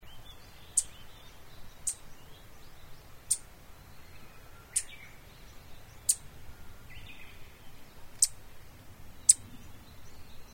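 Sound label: Asian stubtail call note; yabusame jinaki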